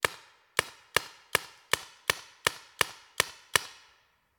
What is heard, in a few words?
Paintball Gun; Sound Ideas; Guns